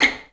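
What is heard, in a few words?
violin pizzicato C6; Best Service; Peter Siedlaczek's Advanced Orchestra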